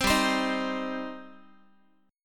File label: G Suspended 4th Sharp 5th G, down, Guitar; Gsus4#5 strummed